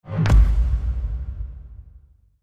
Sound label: Epic Hit; افکت صدای ضربه سینمایی